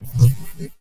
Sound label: Drain Pipe; Convolution; Special Effects; IR Samples; Drain Pipe Etude; Kontakt 5